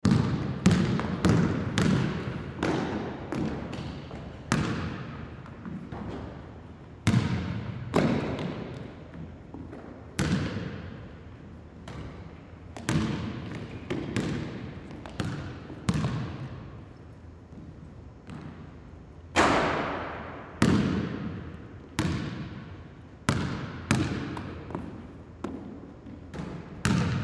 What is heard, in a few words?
Basketball, Basketball Practice Gym Single Person; SPORTS